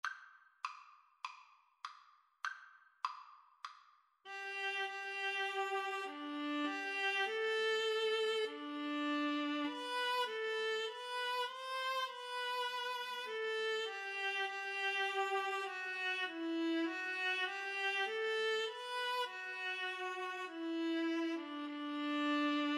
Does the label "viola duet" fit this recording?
Yes